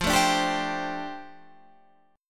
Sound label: D Minor 11th F